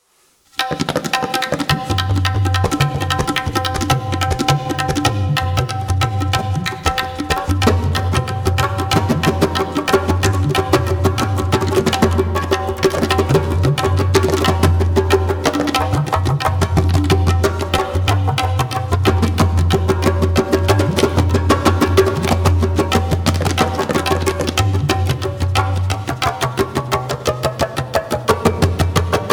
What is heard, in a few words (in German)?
Rhythmische Improvisation